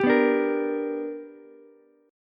B Minor Major 7th Sharp 5th B, Ukulele; BmM7#5 strummed; 12,11,10,13; JGuitar

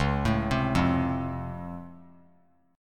Db Augmented Major 7th, down; arpeggiated; JGuitar

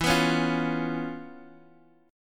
C sharp 7th E; C#7/E; strummed; JGuitar

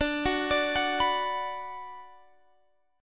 Gb Suspended 4th Sharp 5th D, guitar

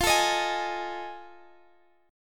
F 7th Sharp 9th, Guitar, Standard; F7#9 strummed; x,x,15,14,16,16

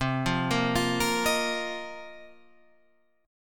A sharp Suspended 2nd Suspended 4th C

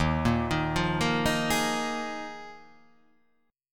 D sharp Suspended 2nd Suspended 4th D sharp

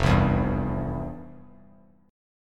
F Major 7th Suspended 2nd, F, down, guitar; strummed; JGuitar

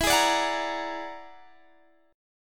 E 9th Flat 5th E down Guitar Standard; E9b5 strummed; JGuitar

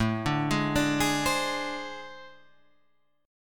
A Minor Major 7th Double Flat 5th, down

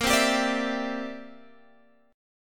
C Major Add 9th, Bb, down, Guitar, Standard; strummed; Cadd9

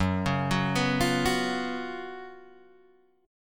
F sharp Major 7th Suspended 4th, Guitar, Open G

